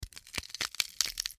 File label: MedicalScientific; Soundfx III